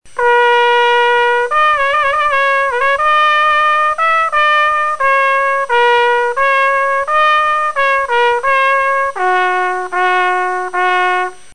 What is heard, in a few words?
Danish trombone; brass